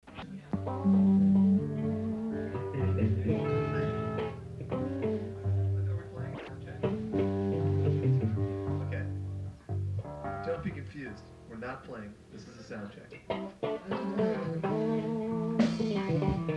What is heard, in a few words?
Tuning; Shelburne, VT; The Ranch